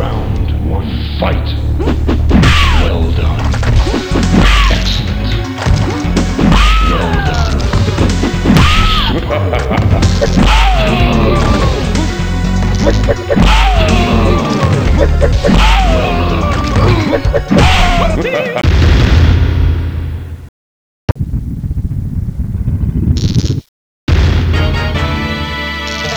mortal kombat arcade; ze freezy xmas

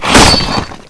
crowbar hit wall

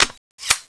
railgun clip in, weapons

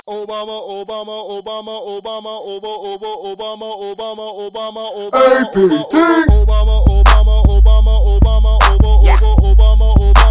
rap

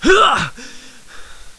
super hit, boxing

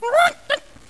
pin pull, weapons

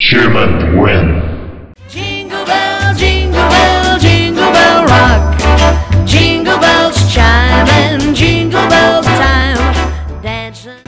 human win; zombie plague

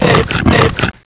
boomer draw; zombi

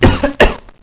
cough; zombie plague

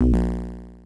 death; n21 sonic classic

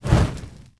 Fire Ball Missile Launch

